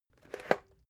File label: water boiler pick up hard